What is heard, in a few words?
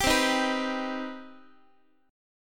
C Minor Major 7th, Guitar; strummed; JGuitar